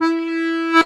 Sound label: ACCORD1 E2, Accordions; USB Soundscan vol.40 - Complete Accordions; AKAI